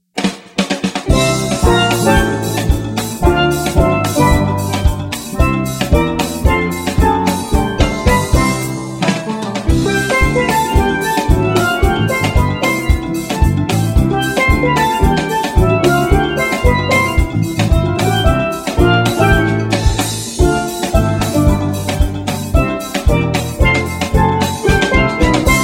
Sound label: Caribbean Steelband; St Thomas